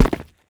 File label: land tile, footsteps; player